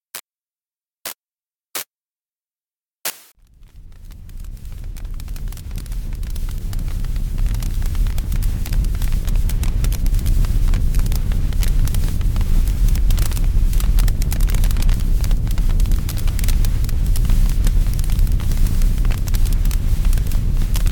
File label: fire